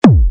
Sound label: kick